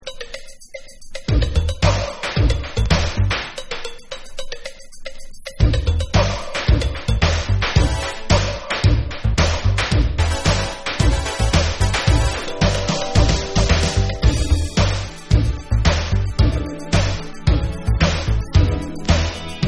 Groove Mix

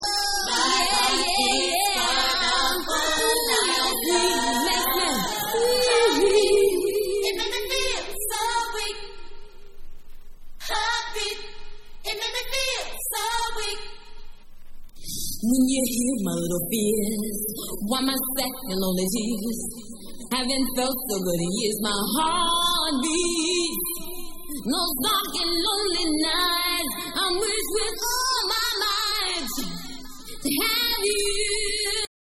HEARTBEAT Acapella

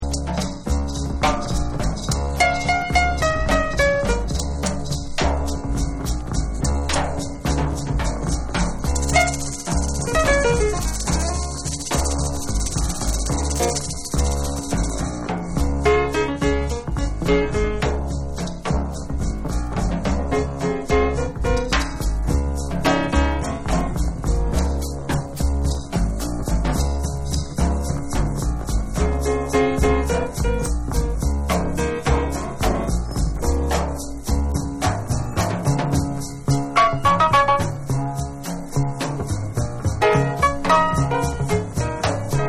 DRUMS

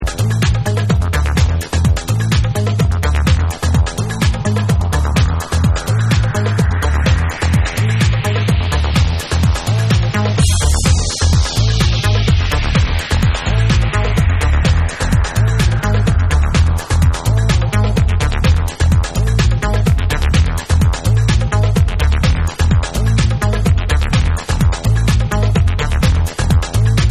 Acid Rock Mix

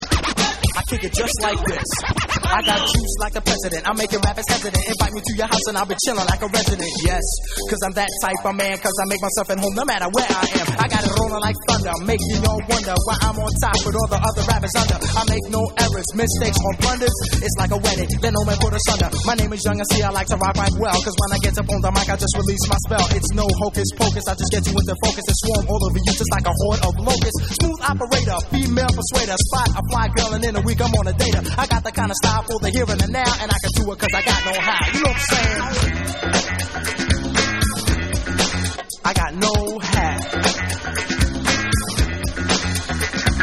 Vocal

